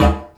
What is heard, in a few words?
Didgeridoo Kit Samples